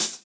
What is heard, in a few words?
Hhat, HiHat; Equipped Music; Loungin House